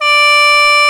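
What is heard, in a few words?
D3 SHENG, CHINESE SHENG; AKAI S6000 CD-ROM; WorldSounds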